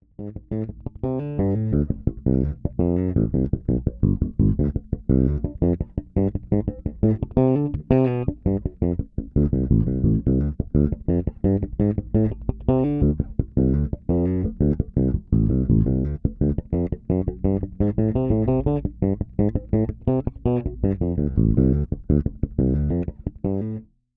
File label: Sadowsky Finger Funk